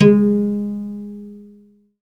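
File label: SOLO CELLO, Synclavier Strings; ILIO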